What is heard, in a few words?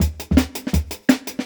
Jungle Breaks